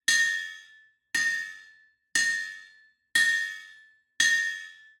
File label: ambience room forge working layer